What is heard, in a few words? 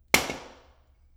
table foley; DoomRTX